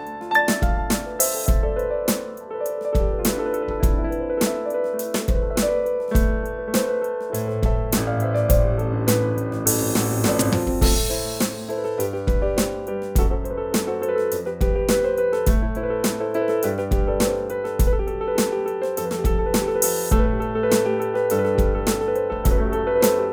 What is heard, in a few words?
solo Piano